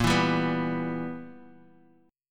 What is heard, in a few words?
B Major 7th Suspended 4th Sharp 5th, chord